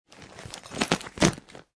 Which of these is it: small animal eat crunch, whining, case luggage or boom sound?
case luggage